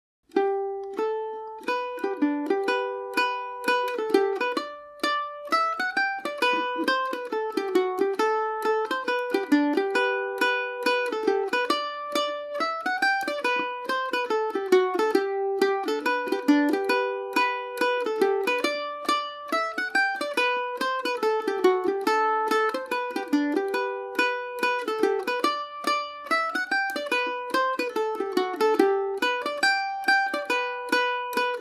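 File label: barndance g major, mandolin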